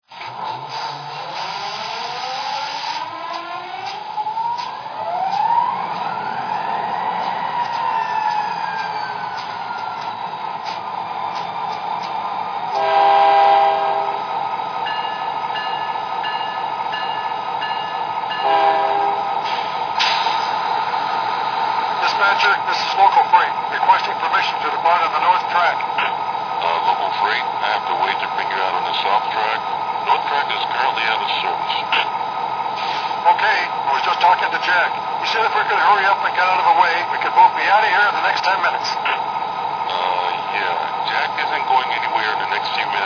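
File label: SD60, locomotive; ns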